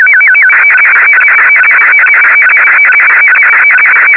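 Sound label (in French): SSTV Scottie 1, Slow Scan TeleVision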